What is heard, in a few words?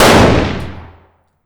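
fire dist 556x45 rifle int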